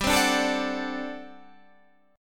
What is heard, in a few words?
G Major 7th Suspended 2nd Suspended 4th G down Guitar Standard; GM7sus2sus4 strummed